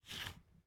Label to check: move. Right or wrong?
Right